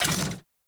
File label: Mech Step Arm Up; Nechro